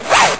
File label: button